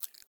Foley Mouth Move; Enemies; Warframe; Catbrow

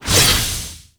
Scythe Whoosh; Weapons; Melee; Warframe; Archwing; Exalted Archwing Scythe

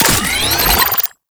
Alt Fire Charge; weapons; Scaldra AK

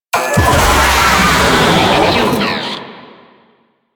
Player Spotted, Vocals; Warframe; Proto Infested; Babau

